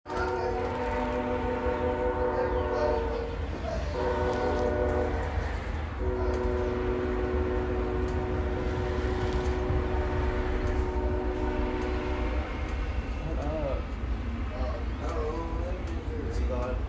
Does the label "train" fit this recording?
Yes